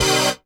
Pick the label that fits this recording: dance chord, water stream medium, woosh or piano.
dance chord